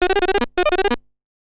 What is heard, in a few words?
biptone, ringtones; Google; NewWave Labs